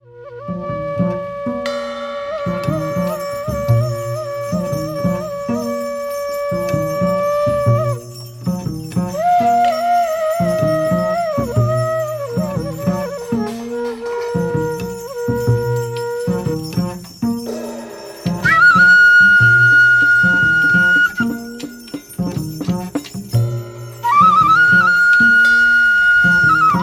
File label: flute song, spiritual jazz